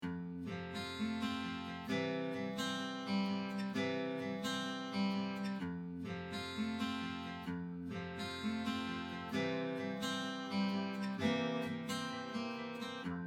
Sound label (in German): Karaoke ohne Sprecher